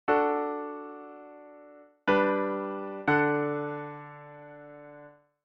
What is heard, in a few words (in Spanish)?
Cadencia Plagal Iv a I, D; auditivo de cadencias autenticas plagales rotas y semicadencias; Identifica la cadencia